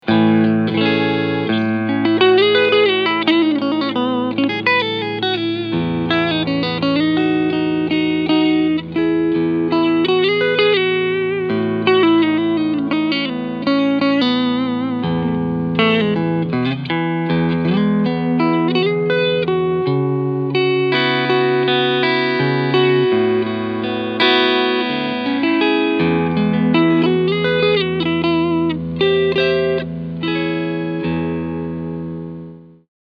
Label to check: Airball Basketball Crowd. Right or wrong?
Wrong